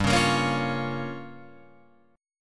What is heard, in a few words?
G Minor 7th Flat 5th, Guitar Open F; Gm7b5; strummed